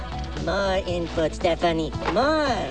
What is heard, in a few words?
pain; alienarena; johnny5